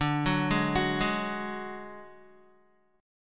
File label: D Major Flat 5th D Guitar, chord; arpeggiated; JGuitar